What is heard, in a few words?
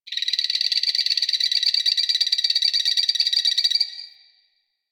Beach Night Poison Frog; Ambience; Warframe; Grineer Beach